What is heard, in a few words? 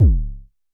Festival Kicks C#1, Drums, Vermona Kick Lancet; LookIMadeAThing Kicks